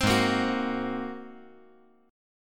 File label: Ab 9th Ab down